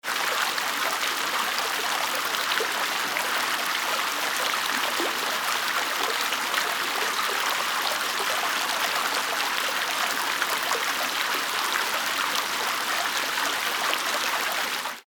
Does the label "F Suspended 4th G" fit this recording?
No